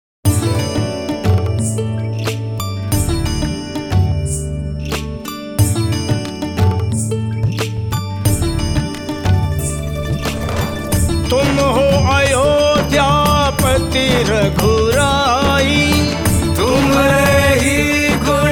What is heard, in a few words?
anthem